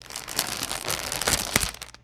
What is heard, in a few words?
thin plastic grocery bag rustling